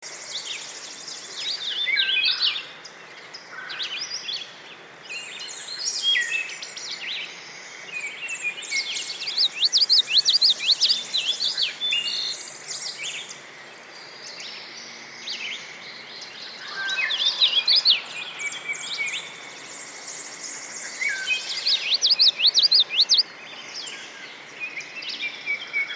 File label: birds forest